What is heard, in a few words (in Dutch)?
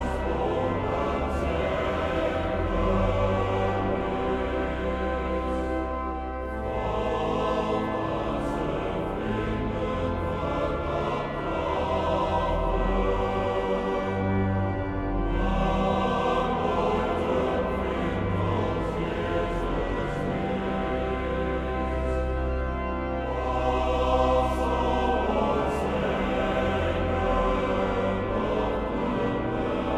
Koor mannen